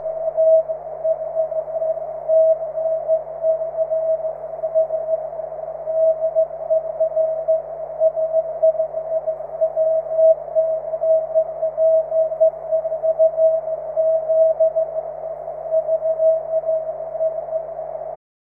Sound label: cw, 23cm